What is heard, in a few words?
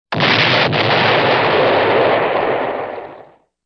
Explosion